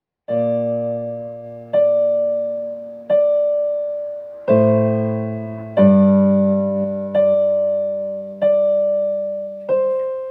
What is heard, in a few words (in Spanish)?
Cancion en el piano